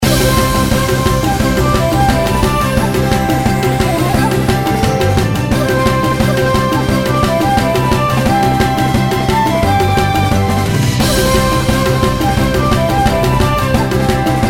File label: Raid Boss; Mist Train Girls